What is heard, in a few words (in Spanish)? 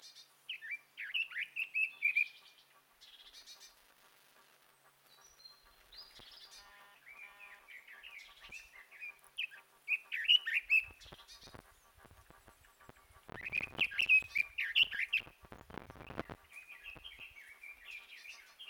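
Ruiseñor del Japon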